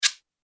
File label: link clicked; qt-webkit-kiosk